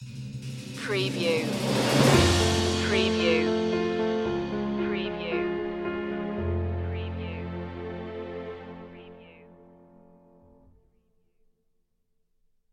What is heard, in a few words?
danse stinger